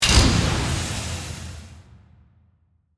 Device Mechanical, Lever, Steam, Release; Blast Wave

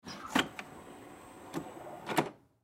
Printer Copy Machine Close Top Electric Scan; Printer Hewlett Packard Copy Machine Close Top Electric Scan; Office; Blastwave

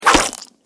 Sword Slash Into Flesh; Sword Sound Effects; combat

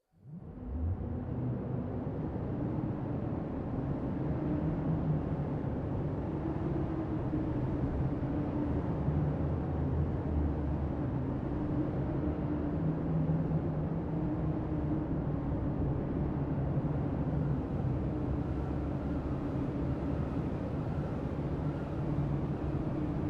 Polar Winds From Inside Log Cabin; Environmental Sound Effects

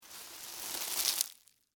Scrape Ice Cream Cone, Debris; Texture; Scrapes; BlastWave

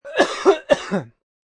Male Double Cough, Male coughing sound; Motorcycles; Vehicles

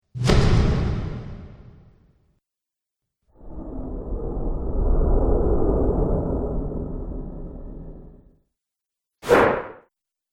Cinematic Accents; Trailer Sound Effects

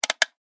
Industry Dial Turn Switch Clicks; Factories

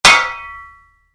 Spade hit, Shovel, Impact; Yard Tools; Stuart Duffield

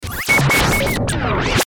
Stinger Beeps Warble Zap; Production Element Imaging Element Accent Transition; Blastwave; Stinger Sound Effects

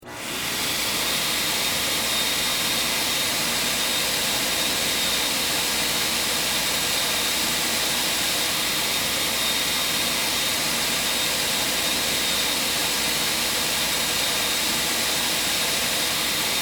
Snow Machine, Theatrical, Stage; Film Set Sound Effects

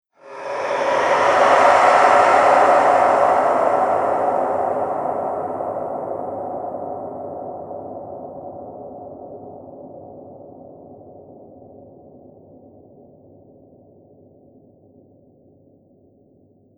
Dragon Sand Lizard, monster; horror

